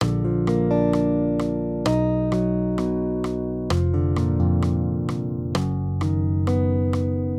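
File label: Accompaniment, Major Triads, Bb-B, 130 BPM, guitar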